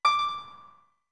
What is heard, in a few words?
Harp D short; D2; OOT